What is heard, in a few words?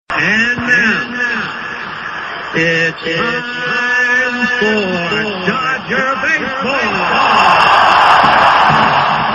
Baseball; Dodger